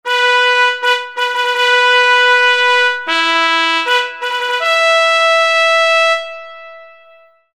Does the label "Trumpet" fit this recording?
Yes